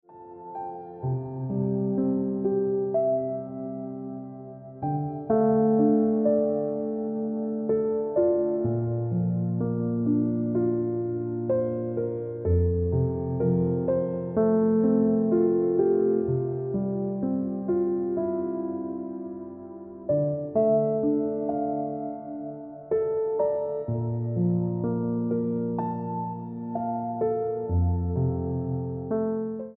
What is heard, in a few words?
Ringtone